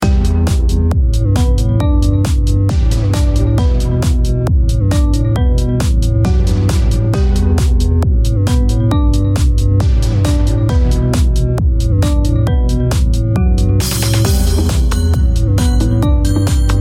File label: funky iphone7 gold ringtone